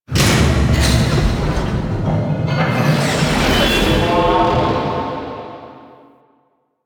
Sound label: Vaults UI Hack Completed; Entrati; Warframe